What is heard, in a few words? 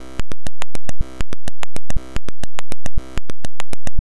Rhythm